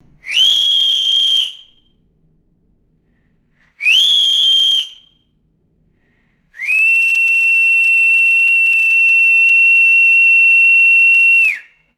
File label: sports referee whistle blowing sequence